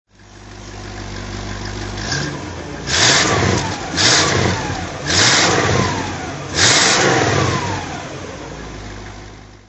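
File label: cadillac throttle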